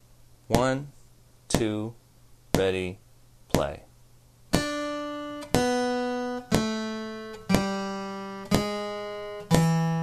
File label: first licks, blues guitar